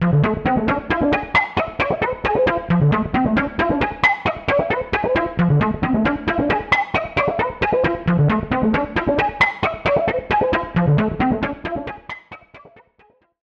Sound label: SEQ Primitive Dance